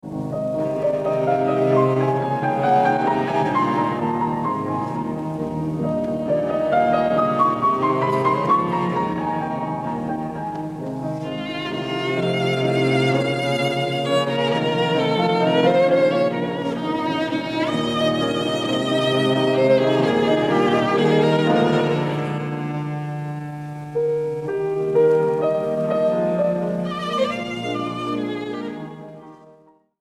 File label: Trio No. 5 in E-flat Op. 70 No. 2 III. Allegretto ma non troppo; Beethoven